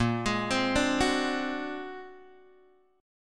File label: A sharp Augmented 9th A sharp Guitar Open G